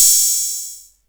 Open Hats

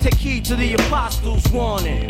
89 BPM; Take heed to the apostle s warning

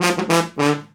tuba second line walk down

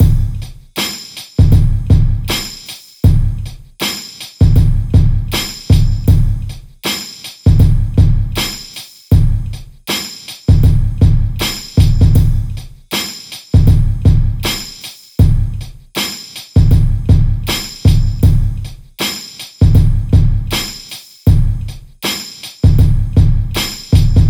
Drum Loop 79 bpm

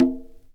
Conga

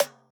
Percs

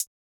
Hi-Hat; Closed Hats

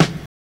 snare 90s

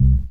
Bass

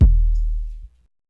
kick BD; kick 06(BD)

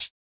shaker one shot big; Percs

